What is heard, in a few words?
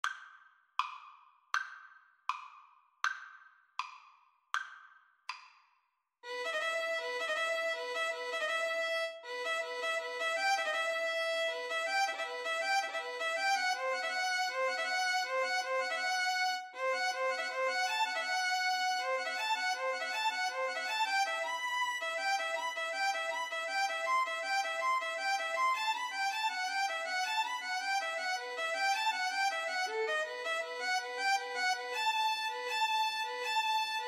violin duet